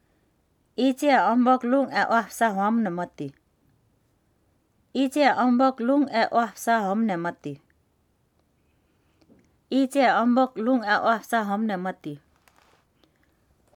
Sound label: hum